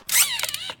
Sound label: Meca; Puddle; puddle plus ios gamerip 2018